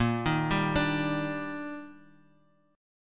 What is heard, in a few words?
D sharp Major 7th A sharp